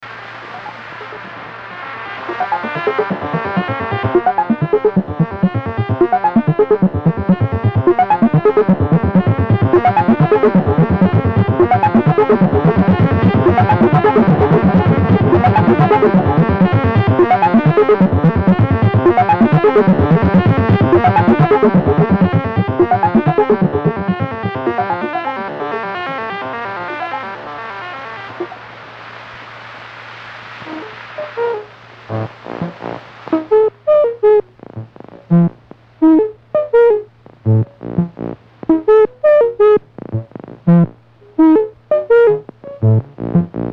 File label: Buchla Box Music; Warners